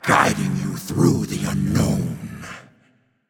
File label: ward placed; smite; janus